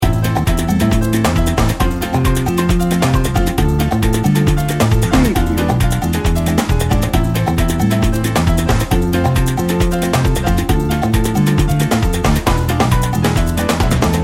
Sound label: latin party loop